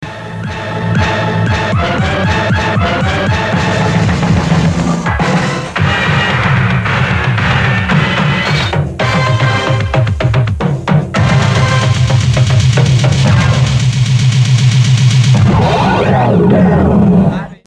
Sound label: countdown tune, jingles